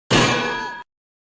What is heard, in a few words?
THURNG